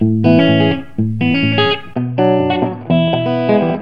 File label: Laid Back Loop